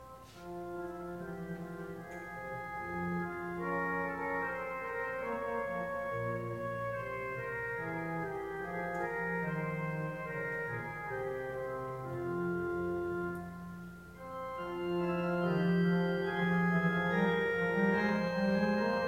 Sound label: Prelude organ; Christ Sits